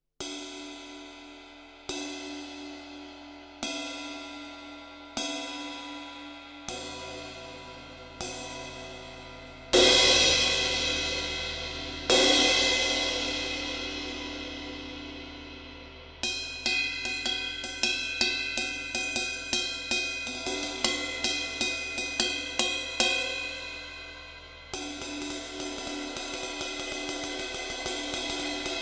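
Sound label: ride BYZANCE THIN TRAD 20, CYMBALES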